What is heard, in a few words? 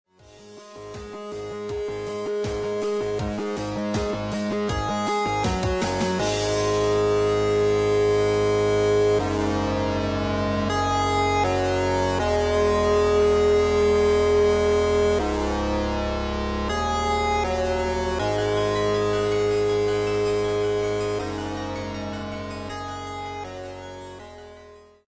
Death by Distortion